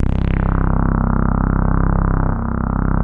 C2, SynthLine; 90 s Sample CDs; NiceBeats; SynthLine VOL-2

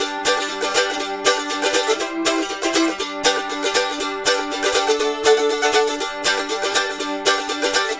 Mandolin Rhythm; Appalachian; Continental Drift Aftershocks

